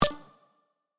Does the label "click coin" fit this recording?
Yes